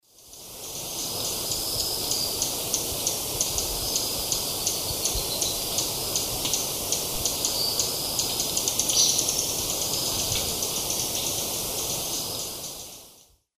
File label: Heliodoxa schreibersii, alarm calls; S. America; Eastern Lowlands